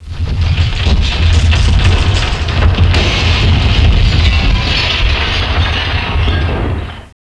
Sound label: tower implode left; ambience